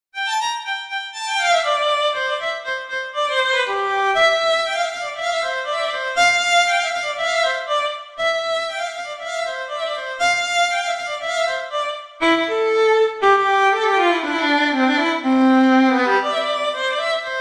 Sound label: violin solo; De Doi